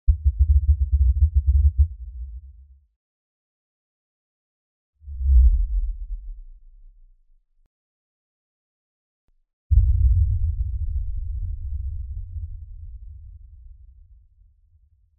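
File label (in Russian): низкие и унылые три набора заканчивающихся коротких музыкальных переходов логотип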